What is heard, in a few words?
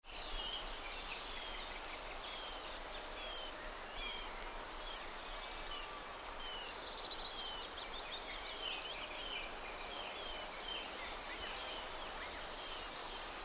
treecreeper